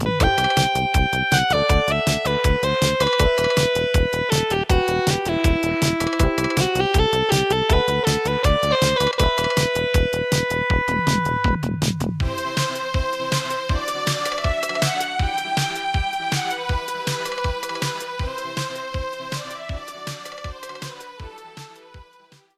Pop 80s, ritmos

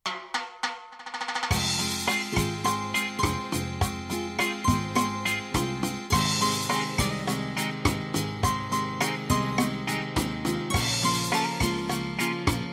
Balada Latin, latino; ritmos

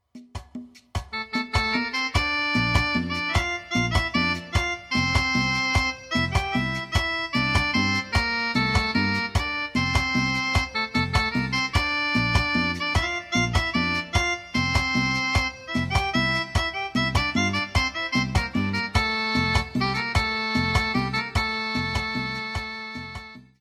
Chilena, ritmos, mariachi

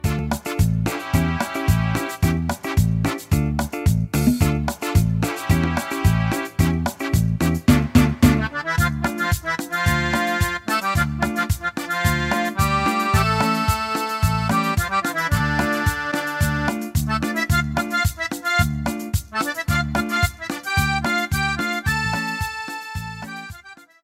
Tropical

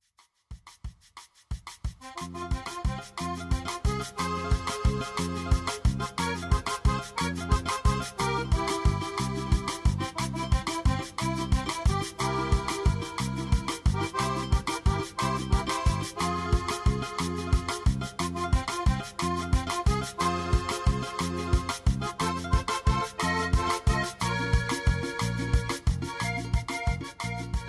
Joropo, ritmos, mariachi

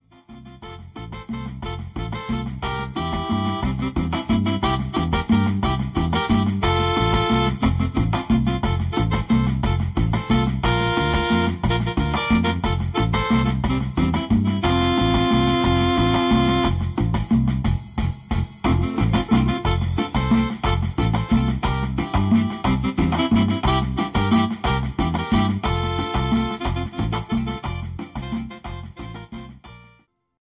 Chilena Oax, mariachi

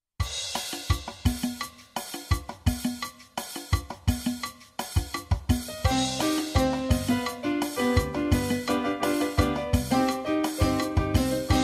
Cumbia Anual

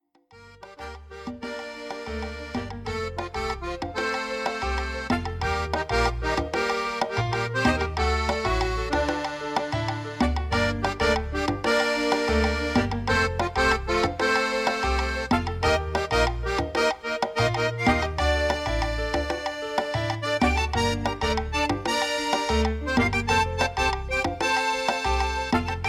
Salsa Colombiana

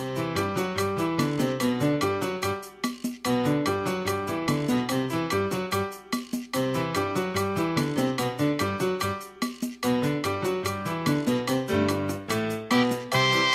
Santanero, ritmos, tropical

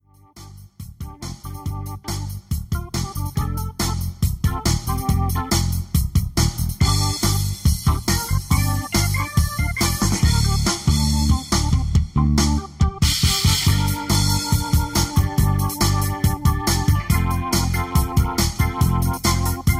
Rock Urbano, latino; ritmos

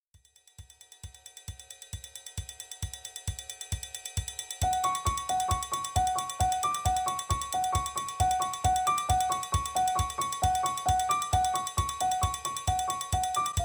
Halloween, ritmos, festivos

